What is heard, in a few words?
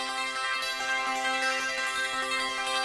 count up fast; druids dream